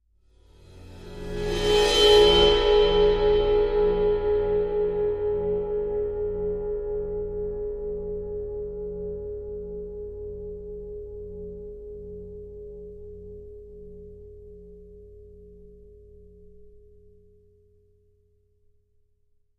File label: Cymbal 18 Bowed; Sabian 18 Cymbol Bowed 2